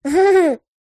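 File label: Toddler Chuckle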